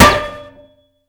Projectile Sounds; Red Orchestra 41-45

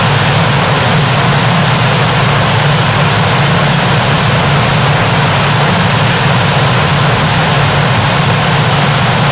Aircrafts Cockpits Depressurization Wind; DCS

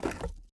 ui pickup wood; Rust

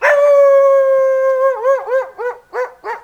howl; Rust

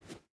footstep rubber boots sand jump start; Rust

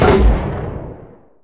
grenade launcher; War Thunder; cannons tanks

